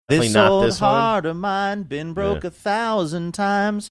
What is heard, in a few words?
singing been broke